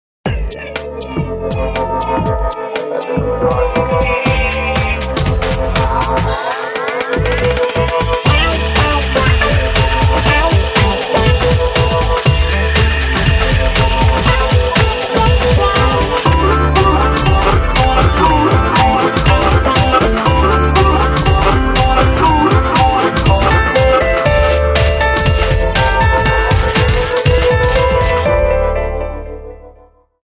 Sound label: GARAGE GROOVE